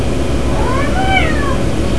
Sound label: cat